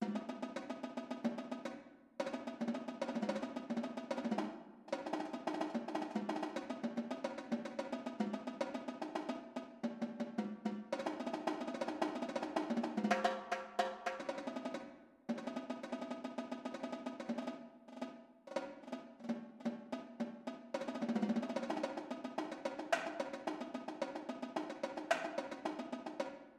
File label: drumline tryout tenor